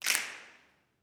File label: SNAPS, Gospel; Spectrasonics; Vocal Planet Vol.1 Gospel; akai